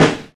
natural snare sample g sharp, Royality free acoustic snare tuned to the G# note; snare drum samples